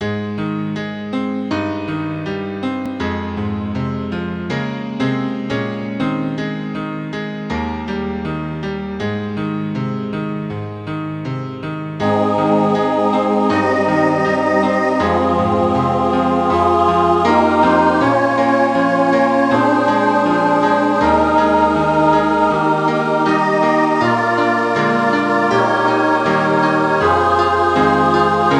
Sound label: ssaa klavierpartitur